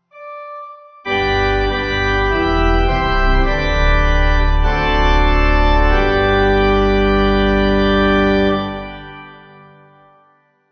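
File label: Morning Service The Gospel Pipe organ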